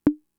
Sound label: Conga, Fricke MFB-522